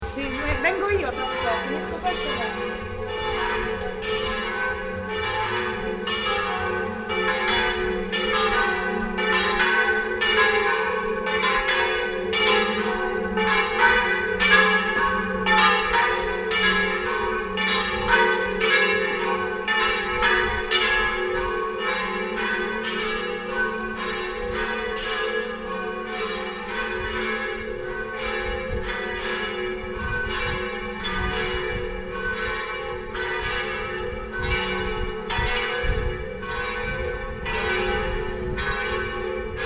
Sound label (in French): resonance Venezia vespera